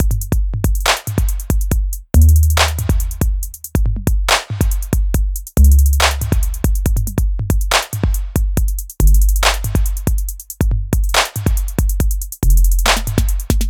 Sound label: Beat